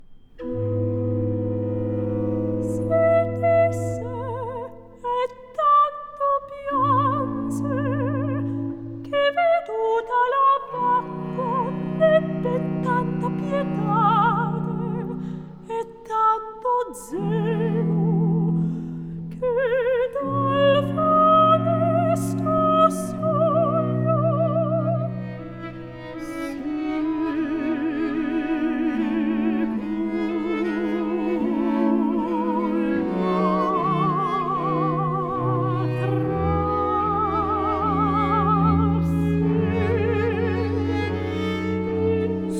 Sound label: Recitativo - Arioso; Arianna; L'Arianna (Ebra d'amor fuggia), H. 242; X. [Recitativo - Arioso] Si disse e tanto pianse